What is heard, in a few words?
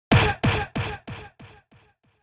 Hit Punch Delay; 打撃 パンチ; ディレイ